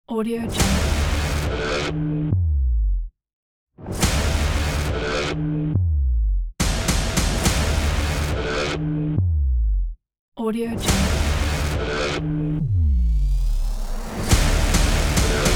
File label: destroyer trailer ident